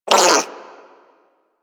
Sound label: Armadillo Vocal Pain; Characters; Warframe; Hunting Critters